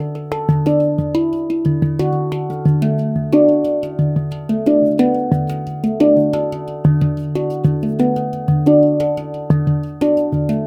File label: 90 A maj Hang